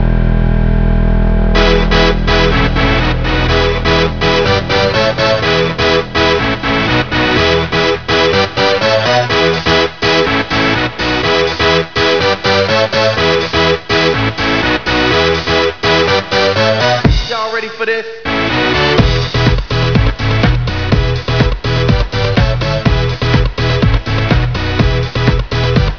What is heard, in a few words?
TECHNO